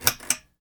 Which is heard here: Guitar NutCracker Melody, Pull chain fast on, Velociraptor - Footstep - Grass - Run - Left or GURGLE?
Pull chain fast on